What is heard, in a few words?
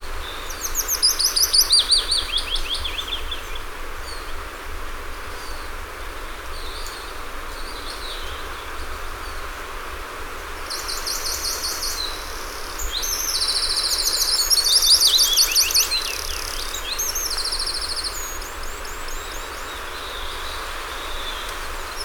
Conifer forest bird sounds